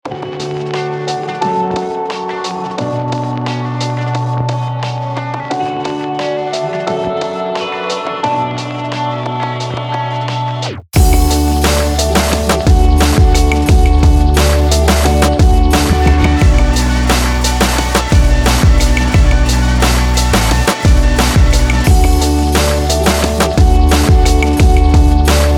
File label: Instrumental; Scrapped